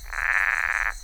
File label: Bokermannohyla hylax